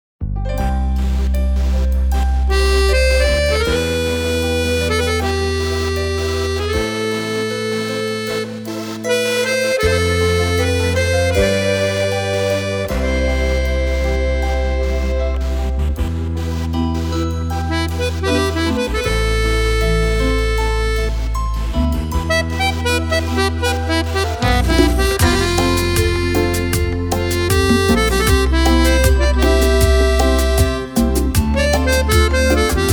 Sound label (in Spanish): Versión Masculino